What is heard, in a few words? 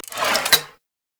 Shotgun Fire Stop Mech; Warframe; Tenno; Alchemist